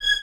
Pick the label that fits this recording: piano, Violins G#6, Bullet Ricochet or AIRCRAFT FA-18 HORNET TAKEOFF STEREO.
Violins G#6